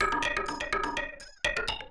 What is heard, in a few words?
PERCLOOP INDST 125, Industrial Loop